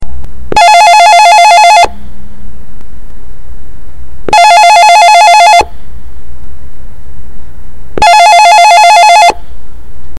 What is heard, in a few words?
Panasonic Bus Phone